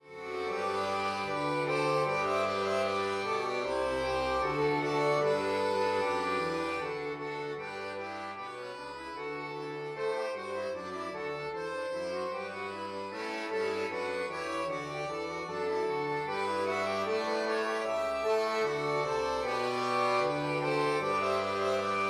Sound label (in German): Kling Glöckchen klingelingeling Playback, Accordion Duo; Traditionelles Kinderlied zur Weihnachtszeit, neu arrangiert für